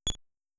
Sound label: Cursor Beep; mother1